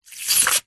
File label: foley balloon pump in water single spray out; zapsplat